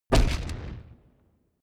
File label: wood stack